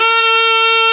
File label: DUDY A#3; SoundBytes - Early Patches